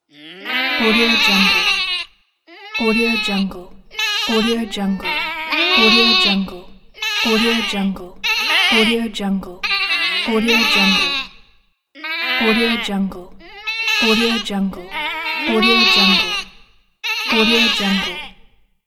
cartoon sheep; marburymedia

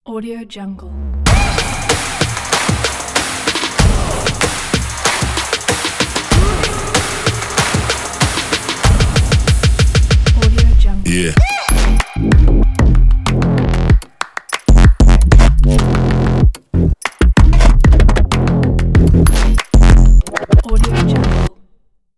action bass logo

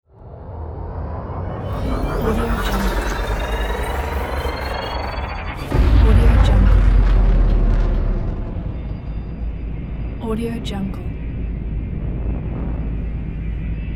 starship engine sound

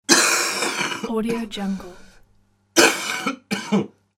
cough

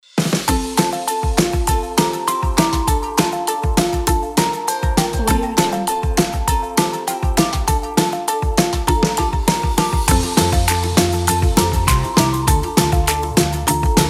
upbeat and fun

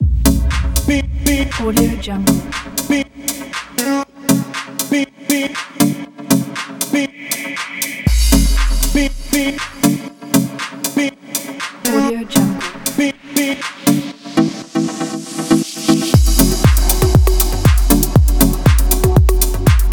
deep house

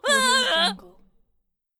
goblin scared

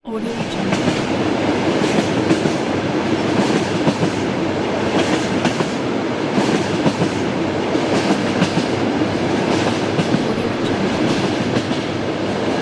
inside moving train

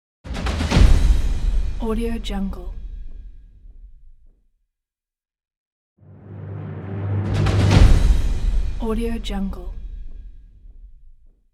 trailer drums hit

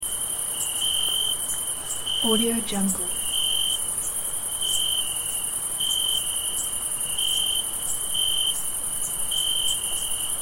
soothing nighttime

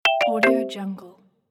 melodic notification

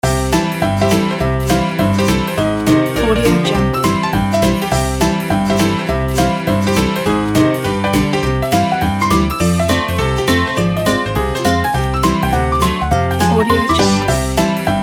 silent comedy ragtime

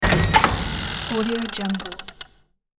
trap door lever mechanism

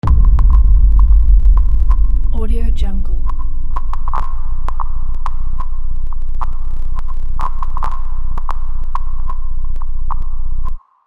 sub noise impact

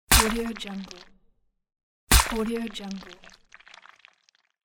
splat

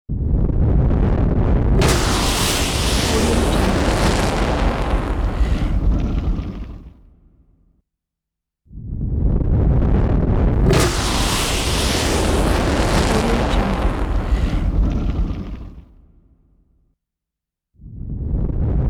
fire burst; prosoundfx

